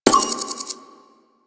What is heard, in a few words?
Moa Data Pad Press; Warframe; Quests; The New War; Veso